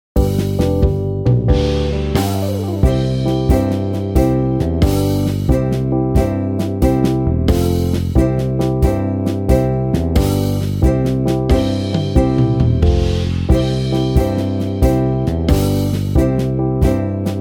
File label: Uke Jam slow, ukulele